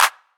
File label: Claps